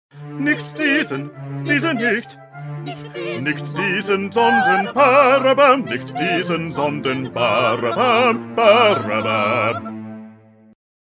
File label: Bass